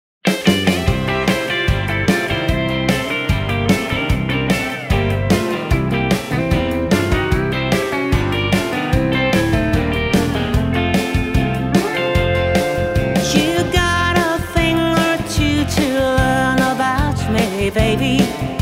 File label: country parlor